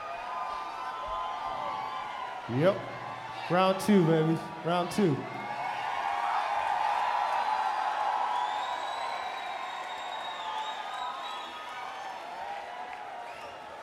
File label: Crowd; The Vic Theatre Chicago IL USA